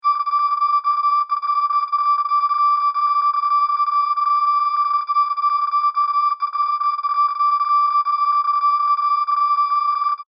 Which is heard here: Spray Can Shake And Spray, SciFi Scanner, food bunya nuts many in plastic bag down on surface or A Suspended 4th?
SciFi Scanner